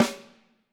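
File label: snare drum, Drums; Bob Clearmountain Drums I; SD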